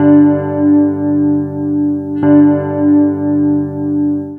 Ac 12 Str Gtr 109bpm G, Twelve String Guitar, African Guitars; Quazibeat; African Secret